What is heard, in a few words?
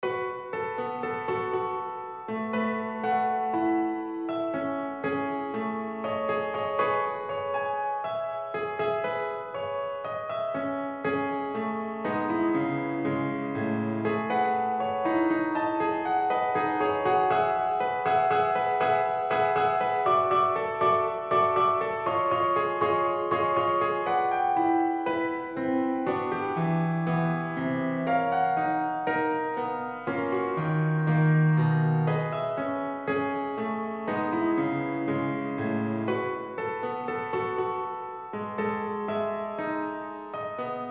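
piano Preludes No. 18 in C minor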